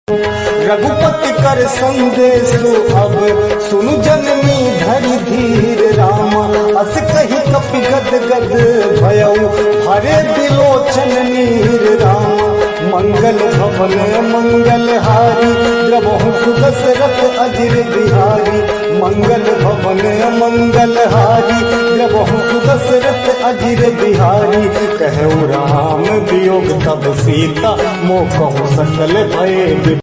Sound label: raghupati kar sandesh ringtone bhakti ringtone hanuman ji ringtone